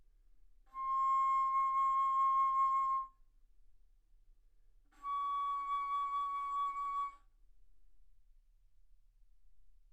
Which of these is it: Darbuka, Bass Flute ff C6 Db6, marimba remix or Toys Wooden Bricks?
Bass Flute ff C6 Db6